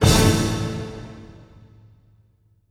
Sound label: orchestral hit; Orchestral Loops